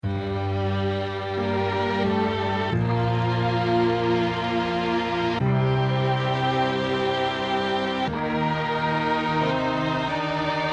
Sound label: beat